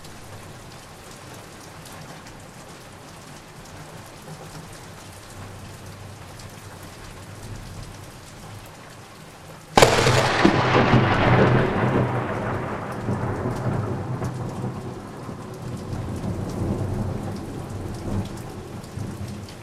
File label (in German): sommergewitter blitzeinschlag